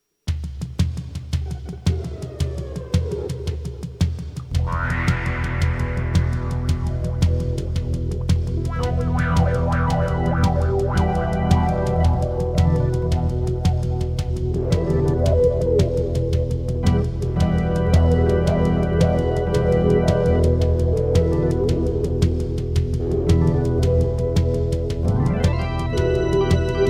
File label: Guitar Instrumental